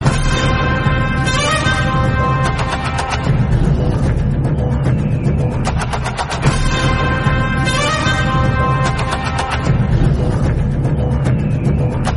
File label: siren instrumental; krack; ravi teja; telugu